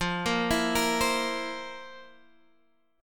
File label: C 7th F, C7/F; arpeggiated